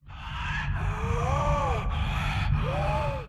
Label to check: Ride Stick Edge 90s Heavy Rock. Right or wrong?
Wrong